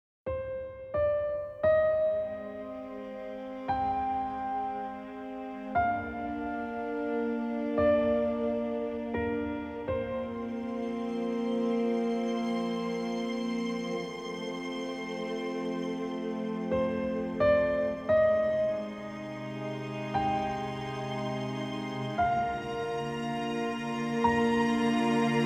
Orchestra Ver. Instrumental; Land of the Lustrous

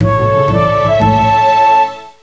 Renaissance Win; Mystery Tales: Time Travel; NDS

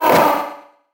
butcher barf windup; Fight Knight

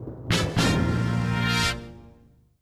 Jingle Ship; StreetPass Mii Plaza; StreetPass Squad; Gold Bone's Ship

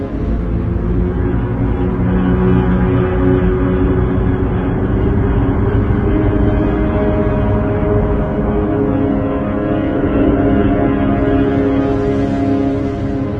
Organ From Hell; Doom 3

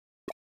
Ticker; Day of the Tentacle Remastered

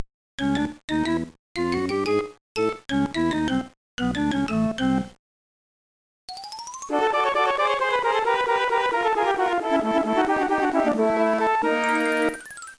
Transition; Tiny Toon Adventures Scary Dreams; gameboy advance

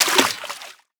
Object Enter Water

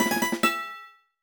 Correct; the legend of zelda phantom hourglass gamerip; Question Five Correct

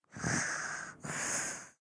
Sleep; Cut the Rope Time Travel; China Sleep03

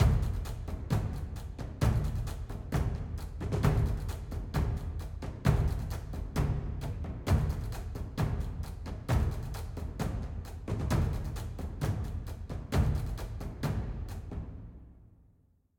Music Battle

